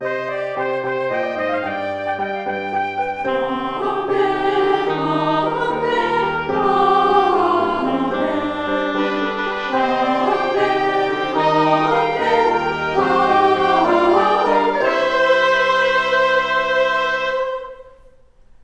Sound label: Amen all parts; Mass of Unity; music ministry